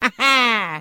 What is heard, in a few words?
"ha ha", Voice